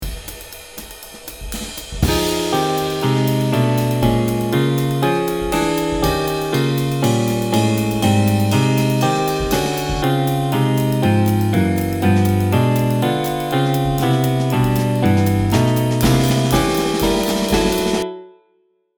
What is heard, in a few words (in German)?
Walking Bass mit Harmonien